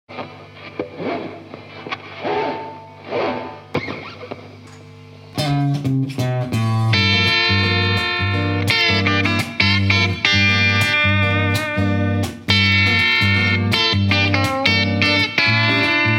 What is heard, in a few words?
What Detuned My Tele